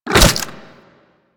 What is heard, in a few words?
Desert Skate Attack Fire A; Warframe; Attacks